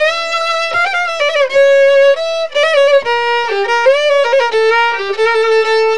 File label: VIOLIN; USB Soundscan vol.63; AKAI